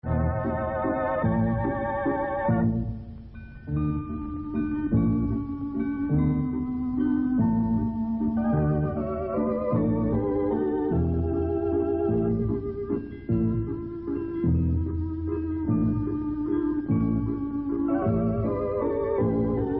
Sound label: Vals, Instrumental